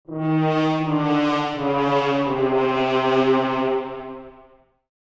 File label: Cartoon fail